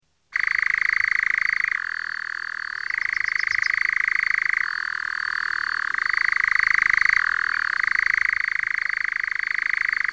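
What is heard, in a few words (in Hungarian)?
Lappantyú, Madarak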